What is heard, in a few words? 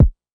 Kick